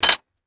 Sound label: sharp metallic ui error clash